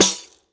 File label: TAMBOURI.HIT; Best Service; World Colours